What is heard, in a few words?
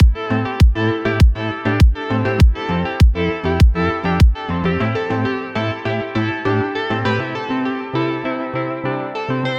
Synth With Sidechain Comp